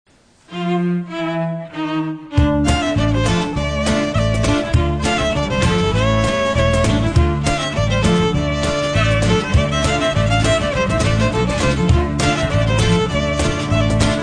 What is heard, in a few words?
Cello